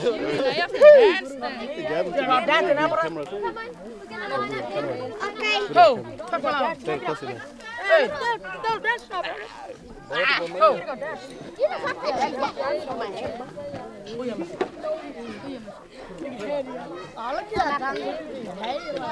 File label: People Sitting By Fire